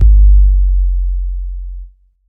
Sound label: Kick